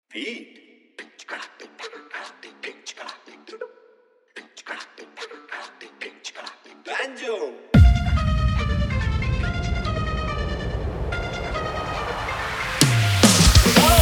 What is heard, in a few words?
Full Faadu Dhollki Bass Dance Mix